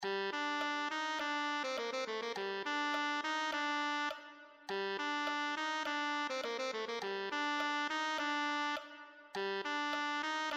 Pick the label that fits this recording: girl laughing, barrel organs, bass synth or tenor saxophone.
tenor saxophone